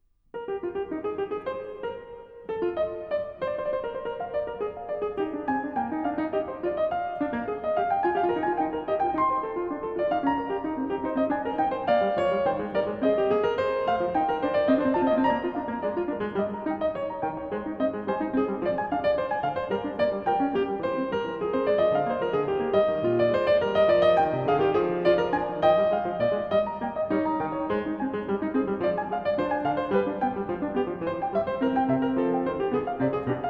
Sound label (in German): Fuge Es-Dur; BWV 852; J.S. Bach; Das Wohltemperierte Klavier Book 1